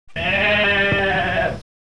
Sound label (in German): ziege